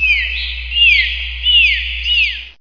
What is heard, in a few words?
Dolphin Sounds